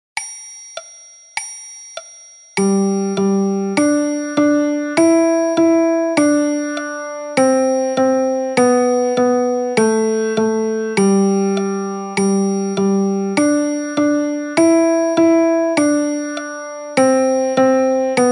Twinkle Twinkle Little Star in G Piano Version

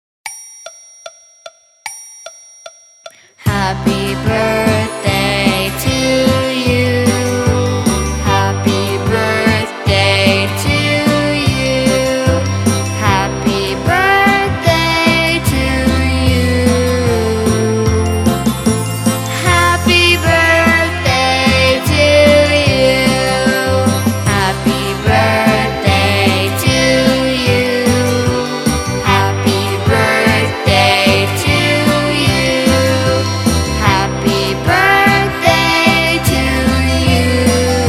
Happy Birthday To You Vocal By Group; تولدت مبارک

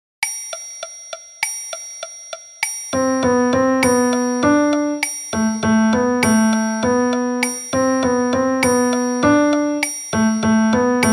Piano Version

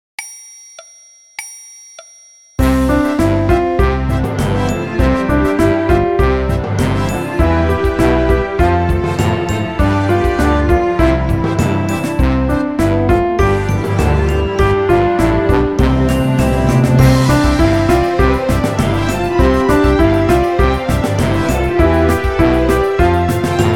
Piano Orch; Molke Iran; ملک ایران

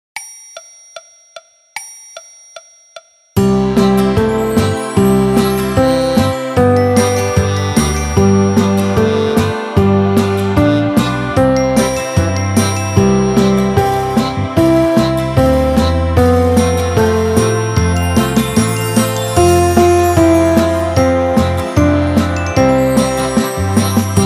Happy Birthday To You Piano Orch; تولدت مبارک

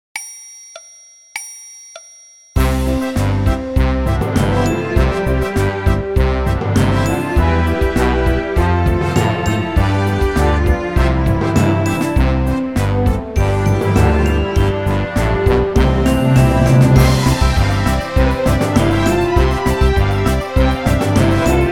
Orchestra Version

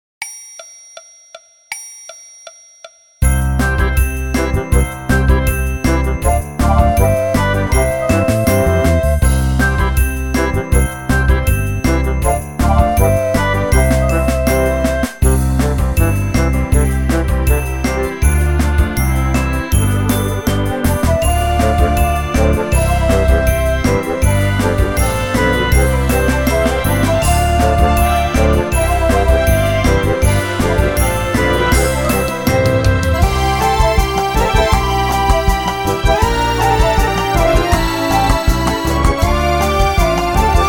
Orchestra Version

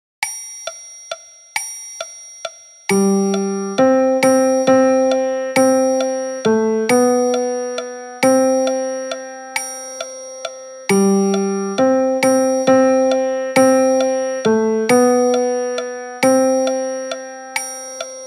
Piano Version

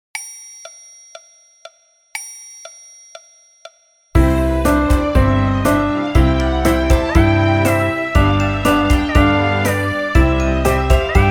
Mary Had a Little Lamb, Piano Orch; Mary Barrei Dasht; مری بره ای داشت

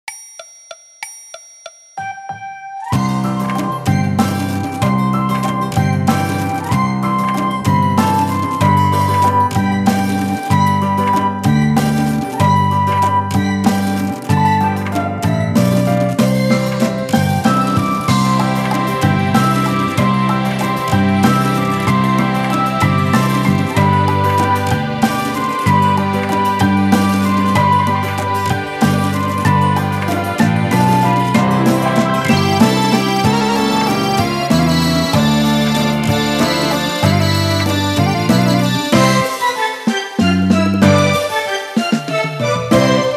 Orchestra Version

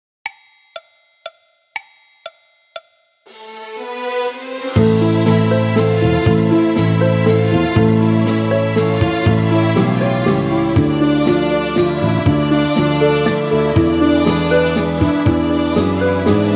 Orchestra Version

